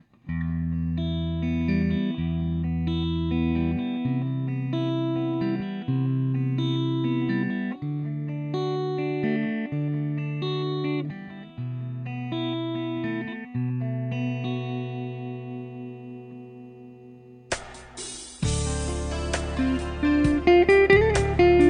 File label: clean